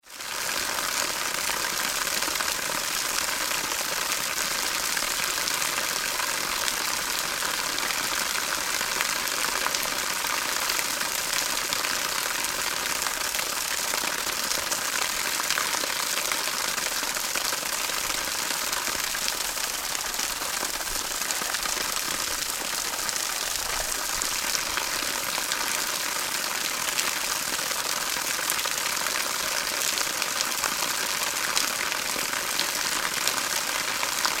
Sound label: Rain Water running from Stone Gully onto ground; Rain Sound Effects